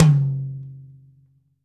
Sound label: high floor tome drum sample c sharp key